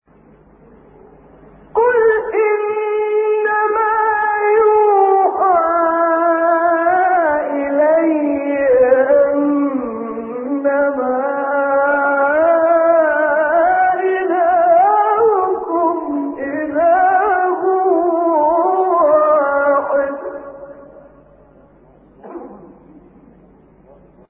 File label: kurd, maqamat